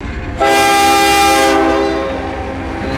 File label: distant diesel train air horn blasts; horns